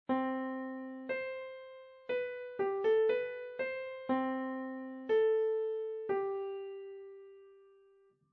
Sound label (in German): Gehoer Septimen; Gehoerbildung